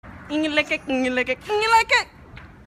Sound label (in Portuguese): violino humano